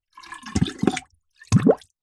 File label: nature water bubbles metal hollow pole sink submerge plastic tub